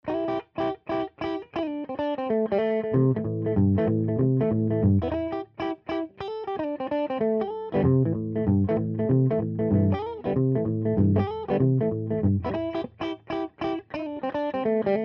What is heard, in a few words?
LP Damp Min